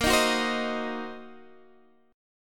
Eb Minor 6th Bb, guitar chord

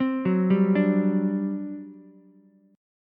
G Major 7th Suspended 4th, G, down, Ukulele; GM7sus4 arpeggiated; JGuitar

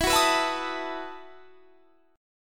E Major 7th Suspended 2nd Suspended 4th; EM7sus2sus4 strummed

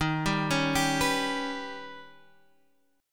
D sharp Augmented 7th

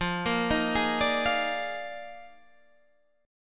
D sharp Major 7th Suspended 2nd Suspended 4th F

